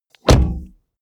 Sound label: car bmw e46 exterior door front close hard; Cars